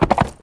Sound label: horse canter; Mount&Blade Warband